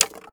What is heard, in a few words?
bones; skyrim